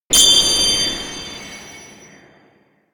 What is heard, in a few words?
elec shootingstar; anemoi